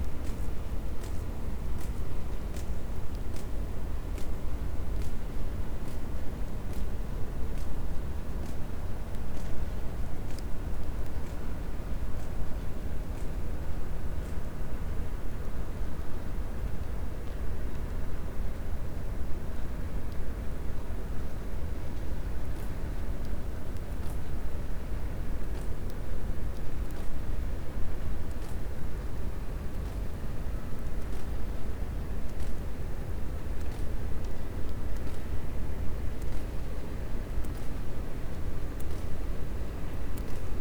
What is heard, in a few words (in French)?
cachalot positif, Physeter Macrocephalus